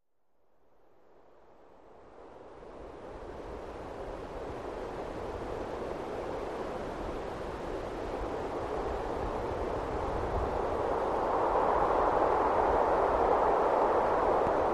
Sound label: Medium Wind Cold Gust, trees & gusts